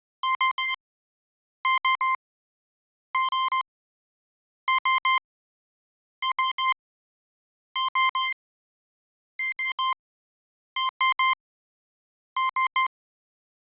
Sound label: Alert